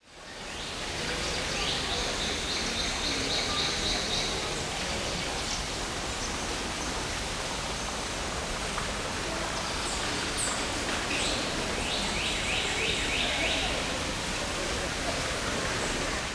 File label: Conservatory